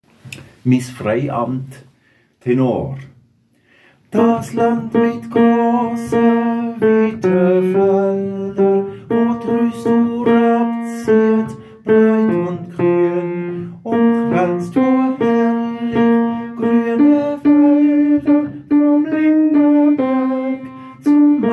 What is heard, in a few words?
Tenor